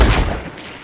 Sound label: sniper shot